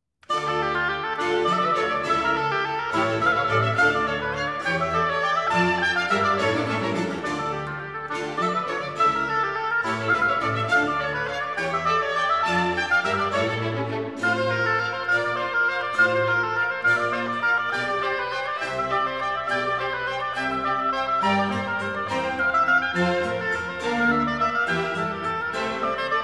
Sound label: oboe; bach; conc d minor